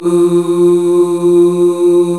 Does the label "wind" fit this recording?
No